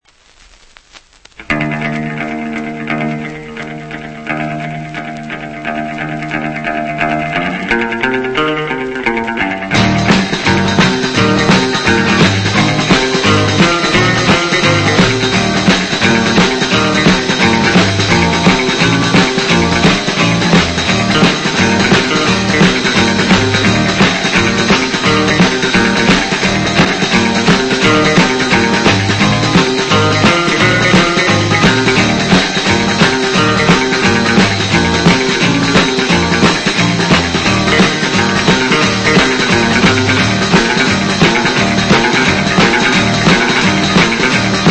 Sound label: guitar